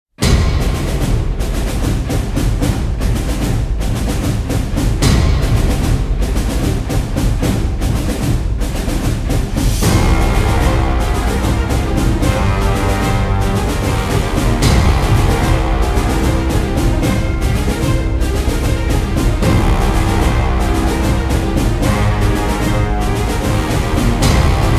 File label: Gathering Forces (No Choir)